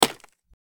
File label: bullet hit wood; metrocraft